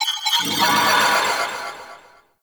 Ship Data Transfer Pulse; Warframe; Corpus Ship Remaster

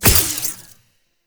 Corruption Pickup Small; Warframe; Missions; Zariman

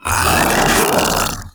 Infested Splitter Joined Vocal Attack Medium

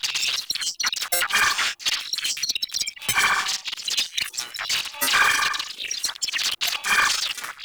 Cannon Hack Loop; Makvoss Cannon

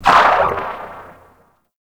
Electro Mag Tail C, Corpus weapons; Warframe

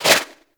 Turbulence Deflect; Warframe; Tengu

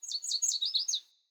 Sparrow Song; Animals; Vocalizations

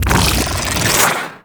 Tesla Charge Up; Warframe; Trapper

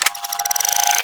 Rifle Shot Secondary Charge; Warframe; Tenno; Tenno Bard Rifle

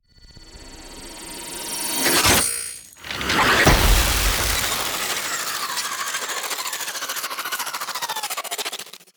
Glaive Throw Interference; Warframe; Cinematics; TheWarWithin; TWWQueensLair

